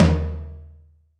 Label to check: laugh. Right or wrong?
Wrong